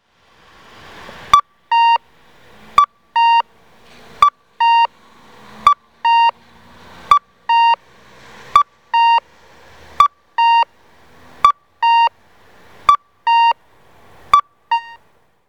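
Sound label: cuckoo, traffic signal; tottori; tomiyasu 2chome; nagoya M-GH-1; kakko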